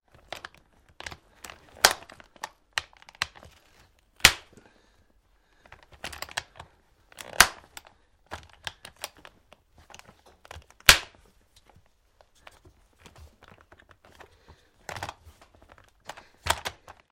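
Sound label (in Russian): звуки сноуборда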